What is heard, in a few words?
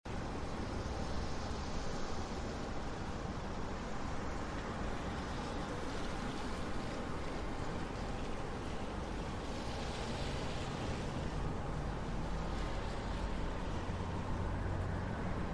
City Music